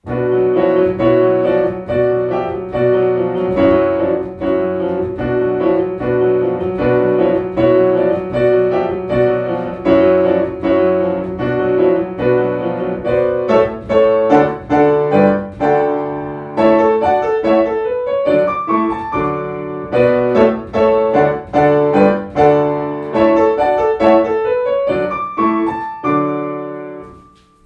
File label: Schubert Ecossaise, Klavier; Yamaha Klavier